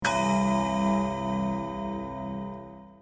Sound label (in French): horloge, Bruitages